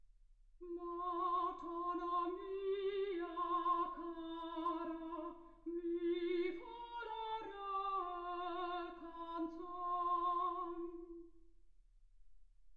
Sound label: soprano